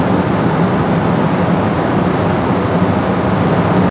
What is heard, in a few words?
Control Room ambience; Max Payne; subway